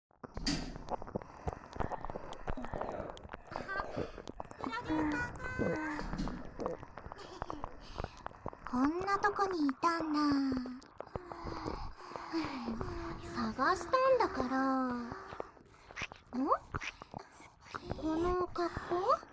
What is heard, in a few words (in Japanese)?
耳元にこびりつく耳舐め音; りりあの悩殺☆尻コキダンス